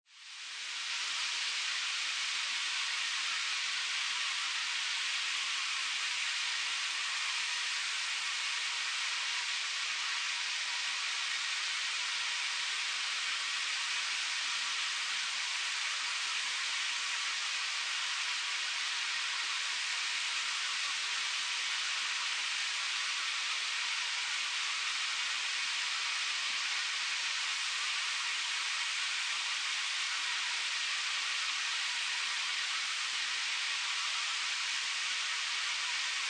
Ambience Jungle Day, Light jungle ambience, exotic, constant buzz of insects; Light jungle ambience, exotic, constant buzz of insects and distant frogs.; Jungle Sound Effects